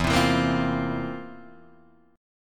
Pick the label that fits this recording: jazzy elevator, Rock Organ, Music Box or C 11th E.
C 11th E